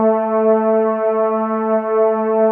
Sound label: WAVE PAD